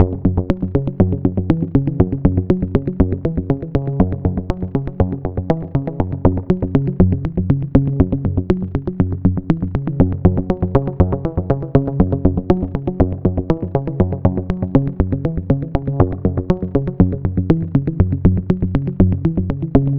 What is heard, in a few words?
BlueBeat [loop]